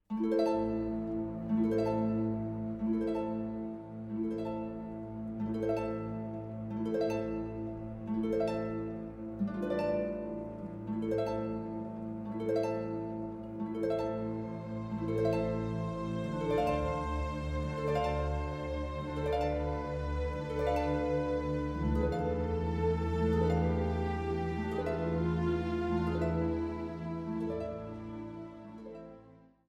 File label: piano